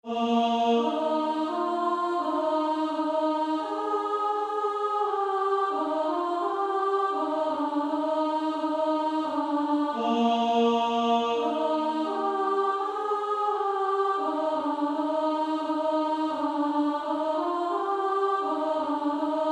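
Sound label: Female Choir alto 2; Christ the Lord is Risen Today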